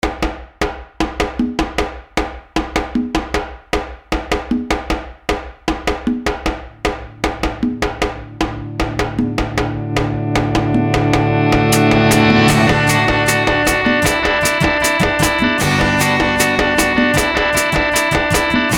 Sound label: Inspirational happy upbeat uplifting cheerful energetic acoustic guitar; corporate; Mantra Studio